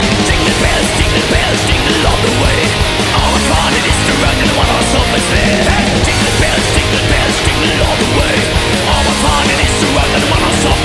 jingle bells trash metal

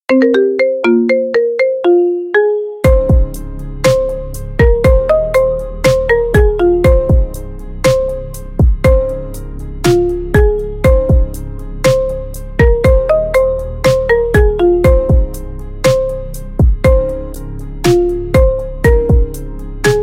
blue grey marimba remix; ringtone

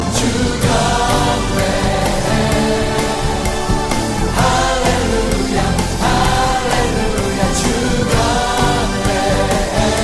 muzika gospel

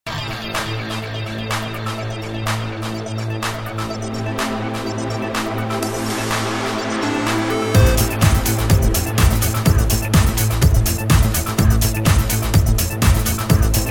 electronica

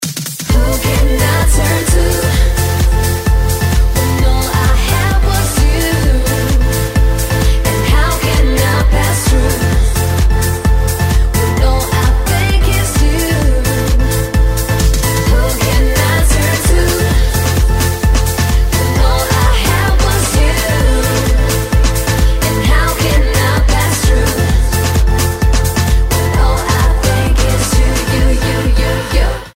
groovebox dream

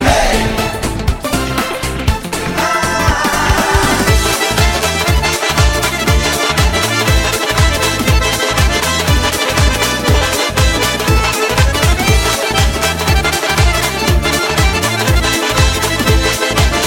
lezginka

